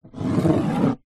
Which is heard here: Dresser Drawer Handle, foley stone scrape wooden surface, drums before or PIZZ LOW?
foley stone scrape wooden surface